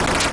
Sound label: 8bit se